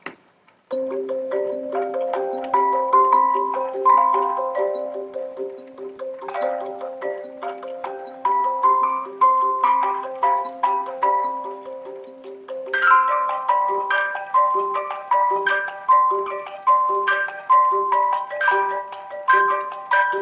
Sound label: Music Box Etude